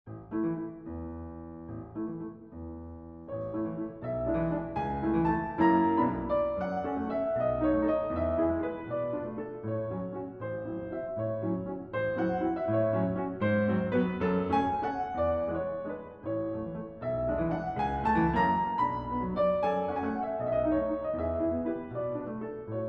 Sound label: Valse